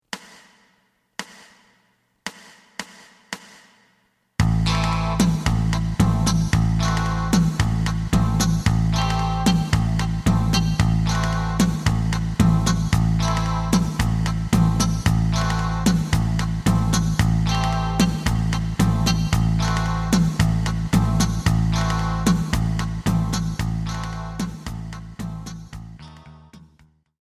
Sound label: lead